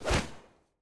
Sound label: miss; samira